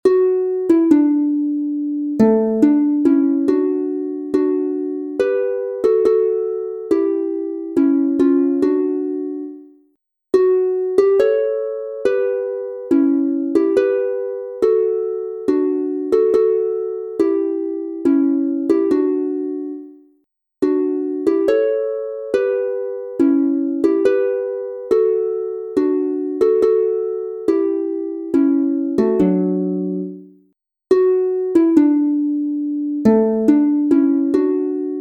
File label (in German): Kuechen und Baenkellieder, Harfe; Traurig toent das Abendgloecklein; Die Mutter im Lazarett; D Dur